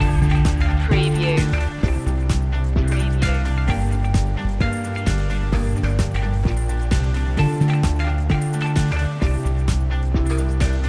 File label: asian corporate loop